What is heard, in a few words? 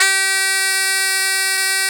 BOMBARDE G2, Celtic; USB Soundscan vol.42; AKAI